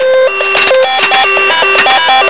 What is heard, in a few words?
WHL SPIN